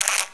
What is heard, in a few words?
laser zoom